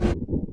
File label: INT shift up, Vehicle; NASCAR Thunder 2004